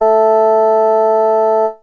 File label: Organ 220Hz